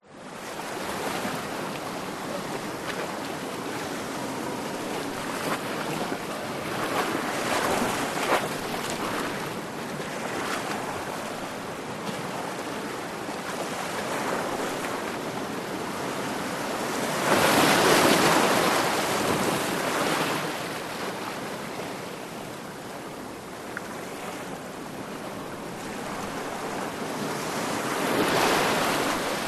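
nature ocean waves medium splash into rocks distant surf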